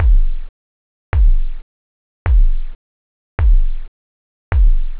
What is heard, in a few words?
kick, drums techno; OctaMED SoundStudio V1